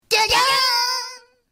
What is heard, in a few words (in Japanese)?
クイズ出題音